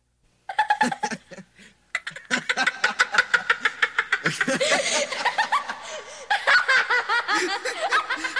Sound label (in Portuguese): risadinha no celular